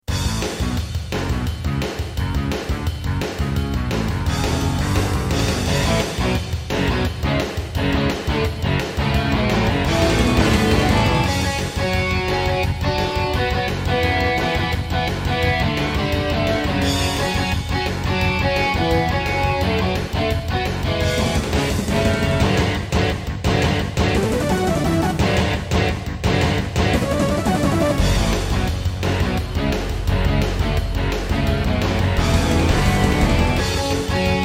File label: Roland SC-8820; Duke Nukem 3D